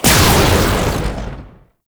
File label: Sniper Shot; Warframe; Sentient; Caliban Prime Sniper